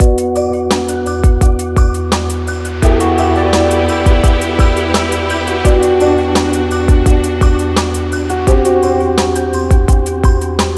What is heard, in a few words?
tension, horror excitement thriller song